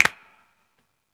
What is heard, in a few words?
CLAP